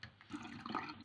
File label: cooling